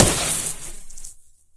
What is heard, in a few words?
hit water, weapons; source engine; dod